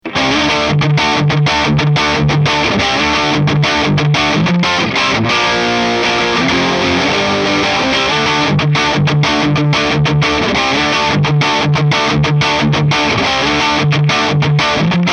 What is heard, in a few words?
V8 stock A5 mag